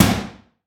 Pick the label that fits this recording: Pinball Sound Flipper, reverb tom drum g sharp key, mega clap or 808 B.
reverb tom drum g sharp key